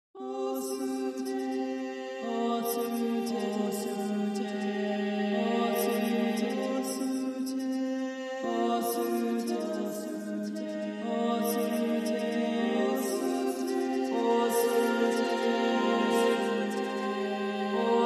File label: Celts And Drums Vocals Only